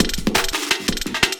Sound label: LOOP, 170 BPM, Drum & Bass